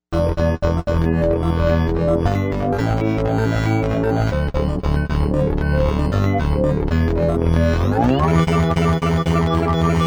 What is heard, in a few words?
Time Machine, Novation UltraNova; Factory Patches